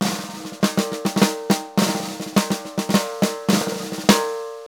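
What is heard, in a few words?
snare rolls; Brush&Rolls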